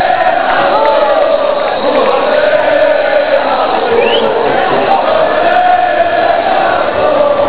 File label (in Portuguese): ole; nautico; nautico 1x0 guarani